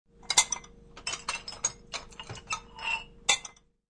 Glasses clanking while being taken out of dishwasher.; Kitchen; Household